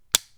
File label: Rifle Dryfiring